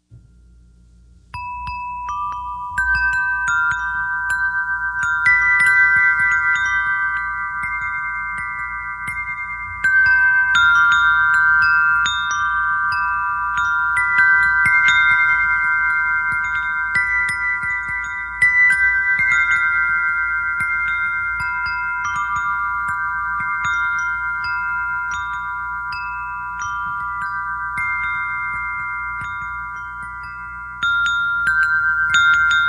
Himalayan Echo Chime

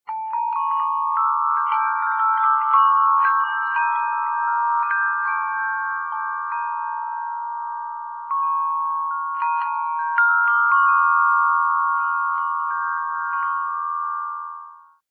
Sop, windchime

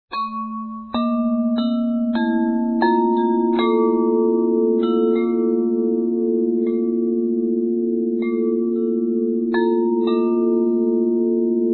Bass, windchime

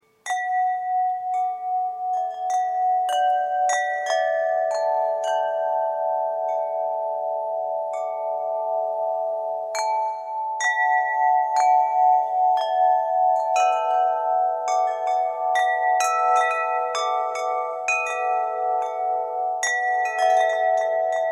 spring medium, windchime

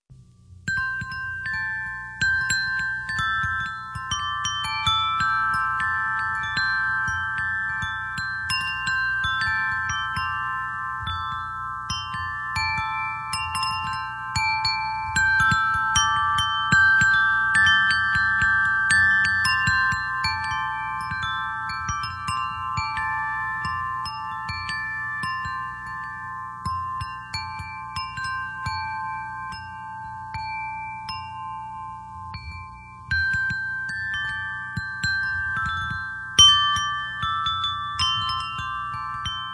Earthsong Chime

